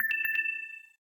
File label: Low Battery